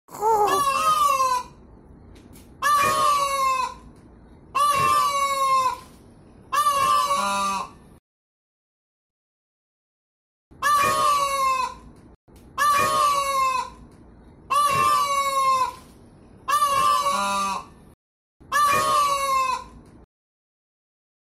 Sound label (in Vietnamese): tiếng chim công mái kêu